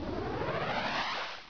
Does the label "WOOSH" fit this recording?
Yes